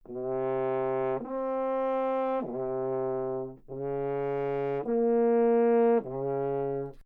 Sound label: french-horn C3 phrase forte legato; solo instruments